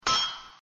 hit bomb; Thunder Fighter

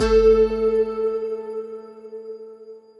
bom prize normal; Great Book Of Magic Deluxe; Wazdan

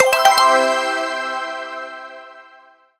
multi line win; Sizzling 777; wazdan

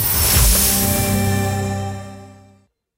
gambler win; Great Book Of Magic Deluxe; Wazdan

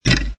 reel stop; American Poker The Legends II

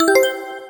collect end; games; Book Of Pharao

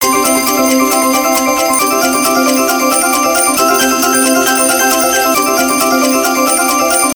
countup; slot game; Lucky Coin

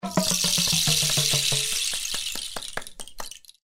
polynesian outro sfx

